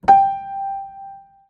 piano g5

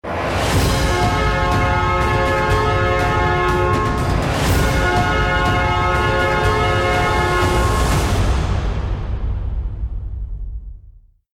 round ten sec count, music

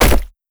body tumble, zombie revived; scripted intro; Half Life Alyx

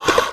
breath fast in; reviver

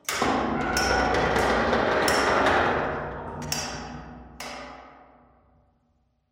ambient pipe bang, metal

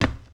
footsteps glass

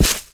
grass, footsteps

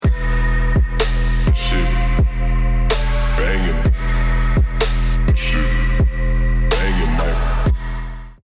lost round, music

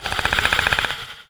warning, antlion; creatures; vox

